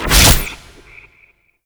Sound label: Experiment Riffle Shot Stop, Weapons; Warframe; Corpus; Sent Experimental Riffle